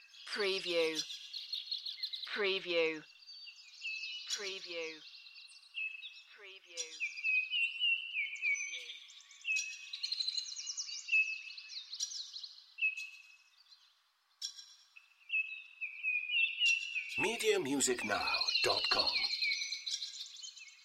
Nature Ambience Birds Farm Morning Loop

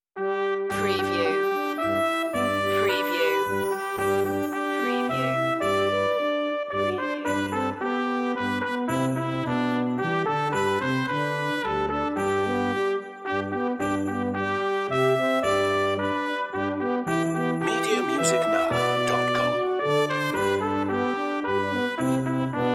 holly and the ivy brass band

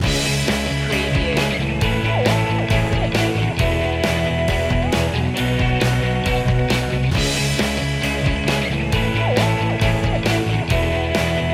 positive loop

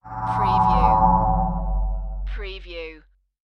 Scanner Beam; sound effects bible

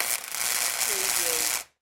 Arc Welder

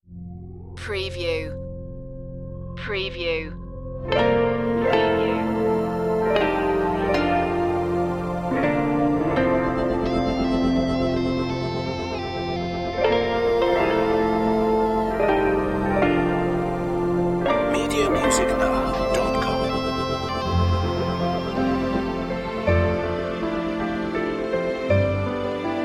fantasy waltz

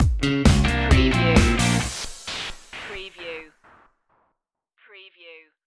dirty disco stinger

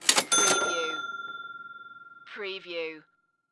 Cash Register Open

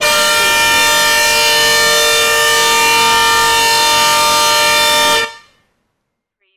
insane truck honking

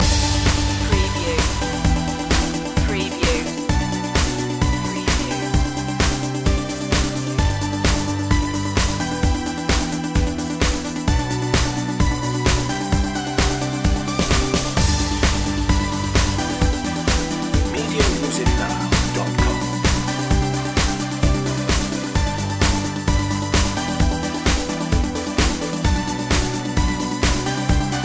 new life loop

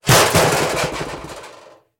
Metal Dropped - garbage can

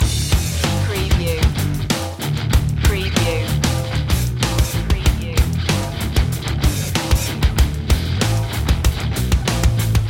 metal rage loop

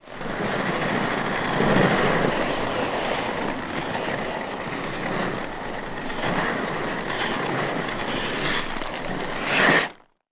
Rollerblade Skate; sound effects bible

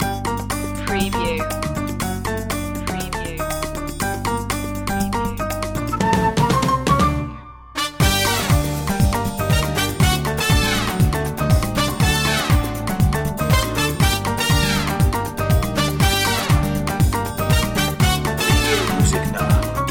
hot summer samba

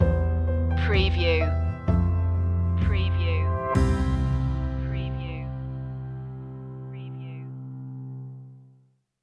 summer is coming stinger

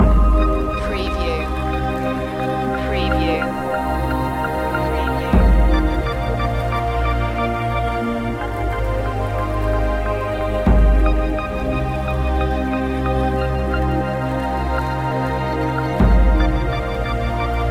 fantasy loop

